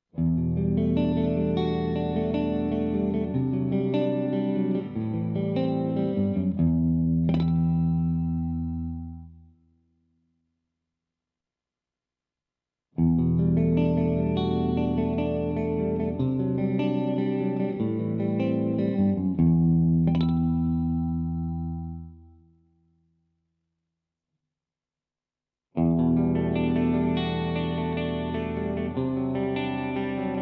Clean HB2CR split coil Humbucker, pickup; panico pickup hb2 custom humbucker

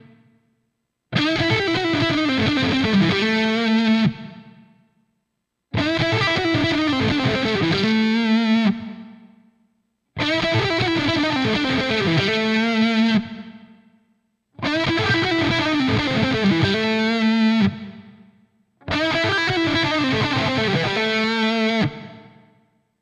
pickup mini humbucker lead split; mh1 2 mini humbucker dual rail; panico pickup

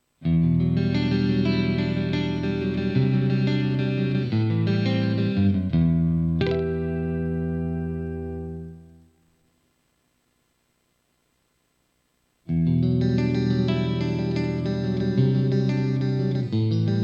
pickup minihumbucker clean split; mh1 2 mini humbucker dual rail; panico pickup

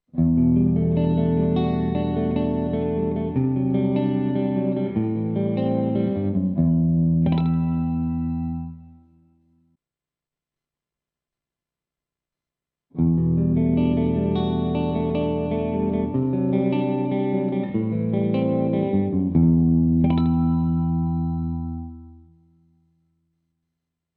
Clean Humbucker, Handcrafted custom humbucker; panico pickup hb2 custom humbucker

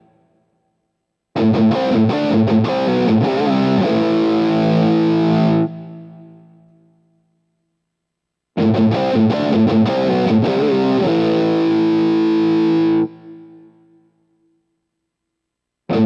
pickup mini humbucker crunch full; mh1 2 mini humbucker dual rail; panico pickup

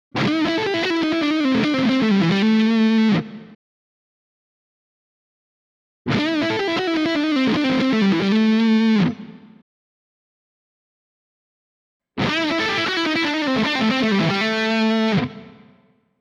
Lead Split coil Humbucker; HB2CR; Black; panico pickup hb2 custom humbucker

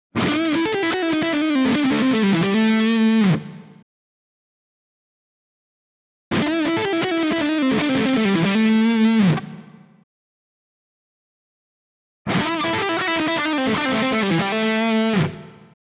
Lead Humbucker Cromo; panico pickup hb2 custom humbucker

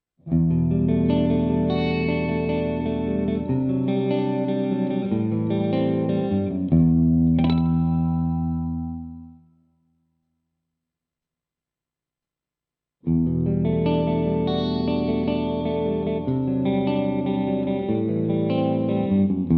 Clean HB1 Humbucker, pickup; panico pickup hb1 humbucker

